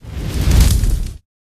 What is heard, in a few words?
fireball; raycaster